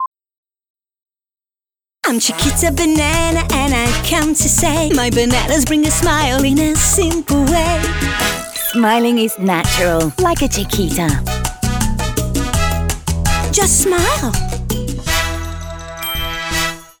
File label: Jingle; Chiquita